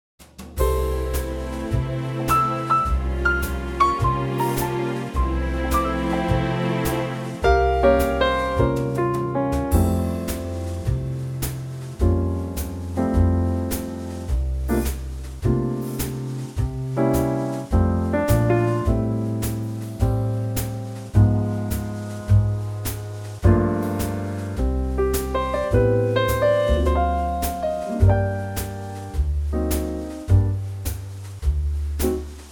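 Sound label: orchestra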